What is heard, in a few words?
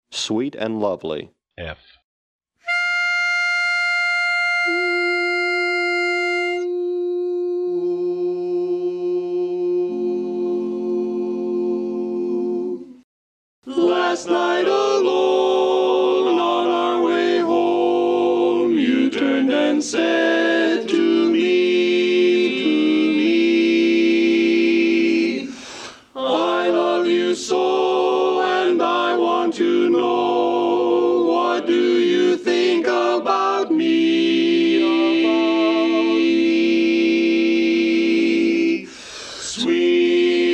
Tenor, barbershop quartet